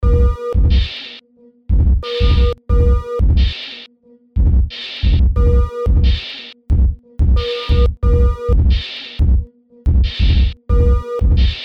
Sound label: Sin Wav